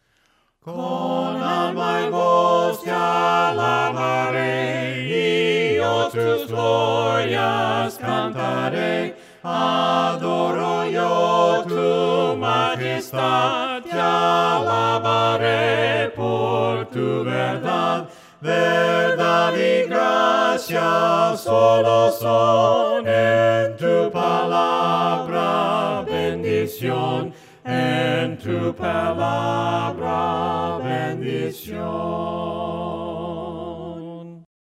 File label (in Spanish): CON ALMA Y VOZ TE ALABARE VOCES, himnos a capella; cantos del camino